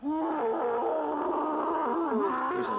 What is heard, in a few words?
scream, pain